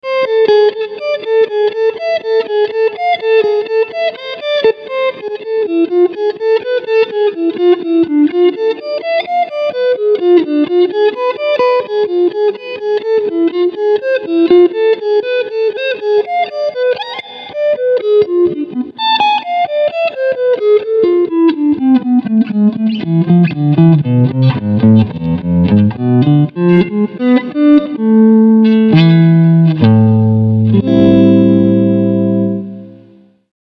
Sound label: Medium Attack Clean Picking